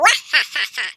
Minion Laugh

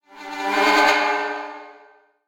Evil Terror Scrape

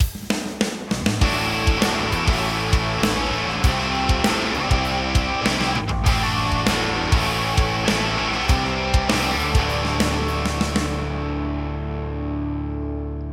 Rock N Roll Transition Song

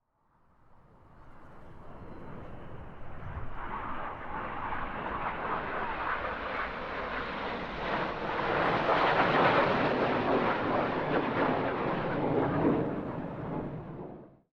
Flyby

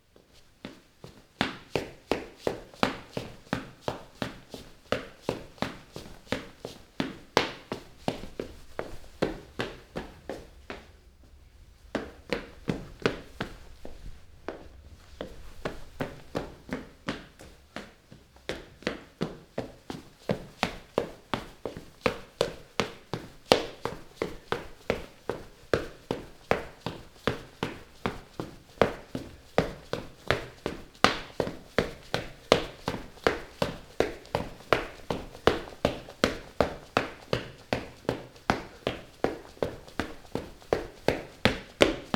Foley Footsteps Running In Room Indoors

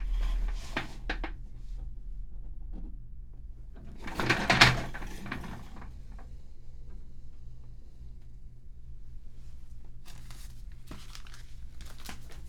Spinning Chair

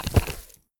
Grass Footstep

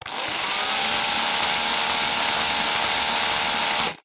Screwdriver Medium power

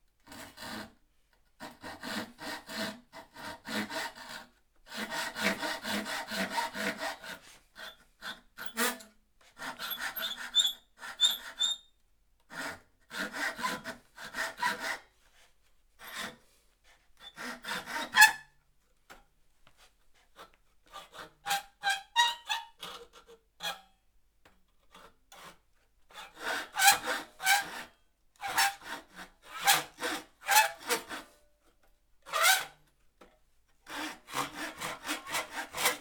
Sawing Wood

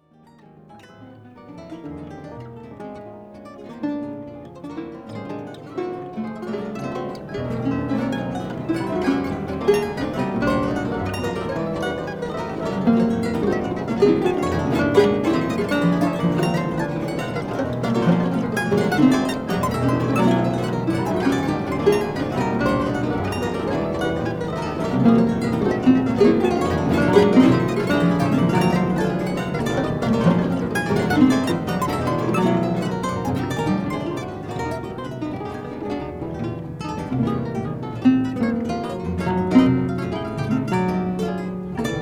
Complex Guitar Cello

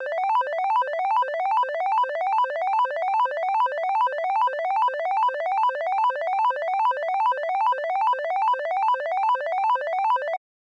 Slot Machine Lydian

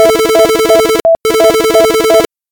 Urgent phone

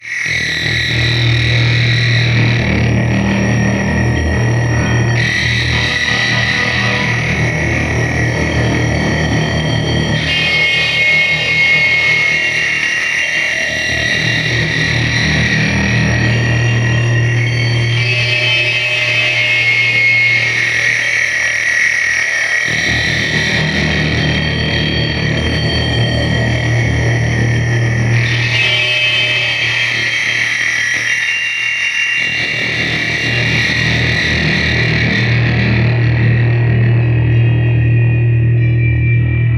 Alien Computer Bsod

